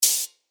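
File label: drum HiHat, Spire; spire trance leads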